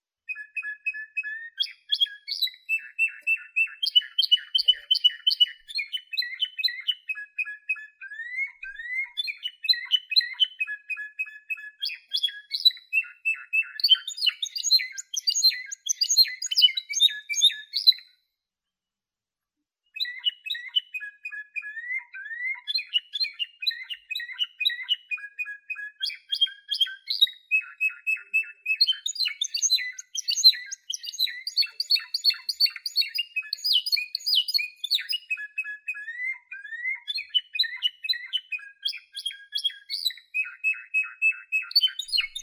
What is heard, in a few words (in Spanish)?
discontinuo, canarios timbrados